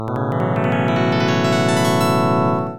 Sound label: ripple JI